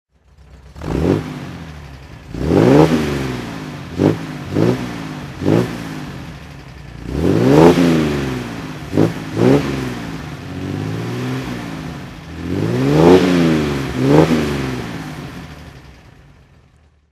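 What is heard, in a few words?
austin healey 3000 mk3 throttle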